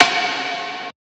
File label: perc sustain; one shots; SEISMIC Drum Sample Pack; Slate